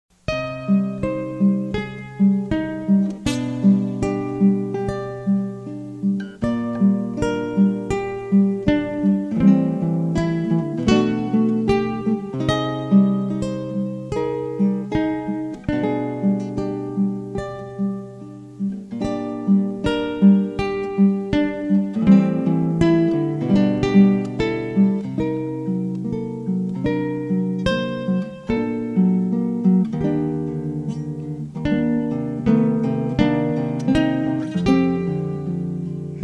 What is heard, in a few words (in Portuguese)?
violao recital canção